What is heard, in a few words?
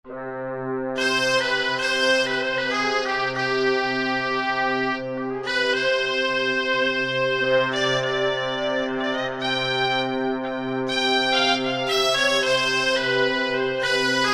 Mosque Reeds; World; Roland SR-JV80-05